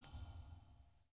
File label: rats reverb; ninjago